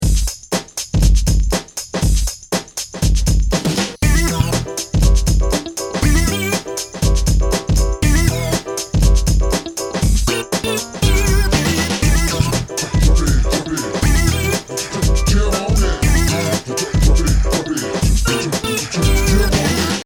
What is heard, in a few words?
120 Em7 funk